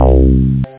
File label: Mono Bass; Utilities Professional